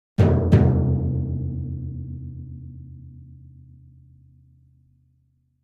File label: Timpani Hands Deep Re; mallets timpani